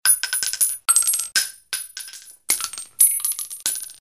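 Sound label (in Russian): падение гильзы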